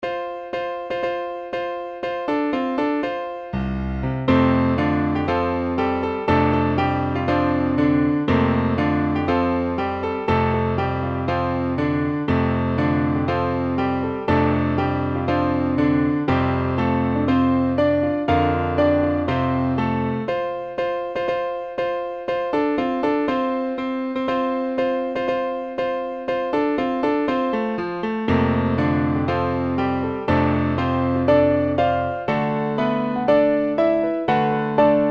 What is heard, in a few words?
Central African